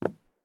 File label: walk wood; Escape from Tarkov